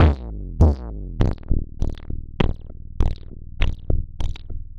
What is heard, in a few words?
perc 100, Percussive Loops, 100 bpm; horridbom